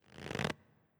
Amazon Attack Prep

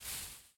Footstep Foliage Bush-Big

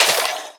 Skeleton Damage

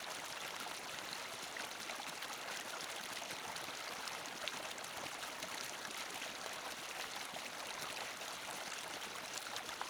Waterway Waterfall Medium; gamerip

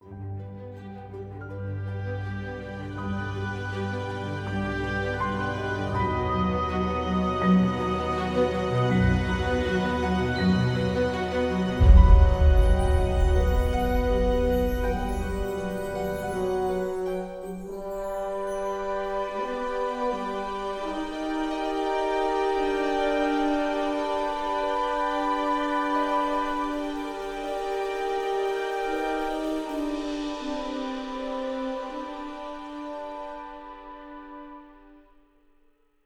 forest music

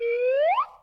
Special Photo Birthday Card Balloon Rise

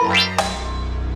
Slaplose; The Act; Arcade, iOS, MacOS, Mobile

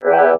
Ghoul Hit Voice

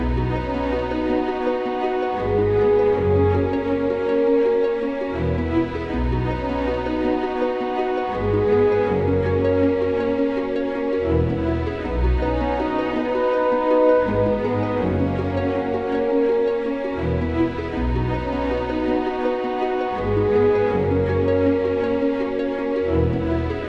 Found Gem

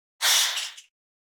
Attack

